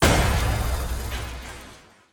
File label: factory boss mecha Destroy Cutscene